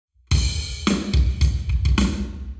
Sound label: Hall Drums 0deg; eMagLS